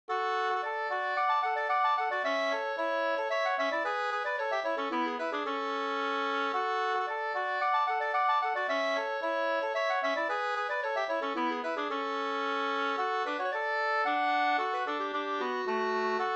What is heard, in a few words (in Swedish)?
polska Bingsjopolska polska; Pekkos Pers lilla polska e Pekkos Helmer 2st